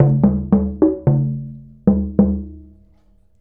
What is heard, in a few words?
Mallet Drum; Sonic Foundry (Sony Creative Software) - Essential Percussion